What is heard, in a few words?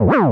Bowser Hit; SMW